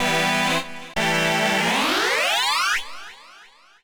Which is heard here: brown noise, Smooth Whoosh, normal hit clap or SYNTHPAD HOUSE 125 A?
SYNTHPAD HOUSE 125 A